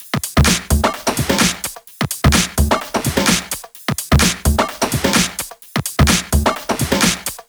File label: 128BPM Tribal Kiss Kit